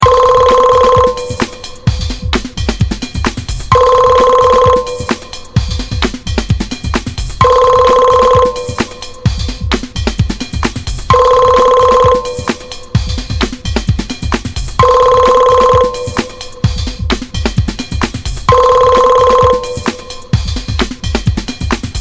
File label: ring hop, hip hop ringtones